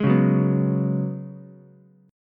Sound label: C sharp 7th Suspended 4th C sharp, Ukulele; C#7sus4; strummed; JGuitar